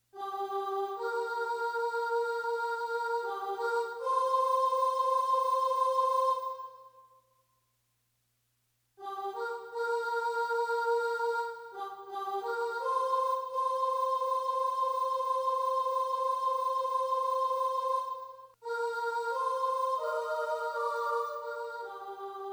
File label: soprano